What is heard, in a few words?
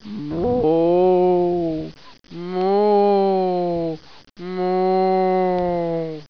moo